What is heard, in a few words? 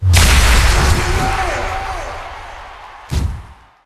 large Win